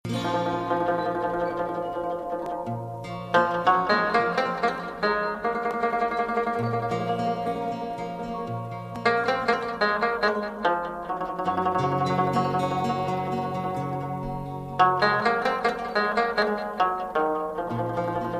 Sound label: Arab Music